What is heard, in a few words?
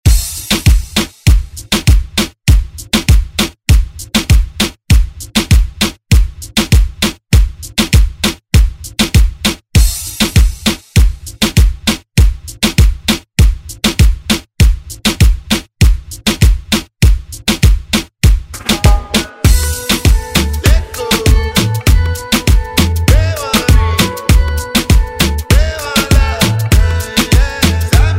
Moombahton Redrum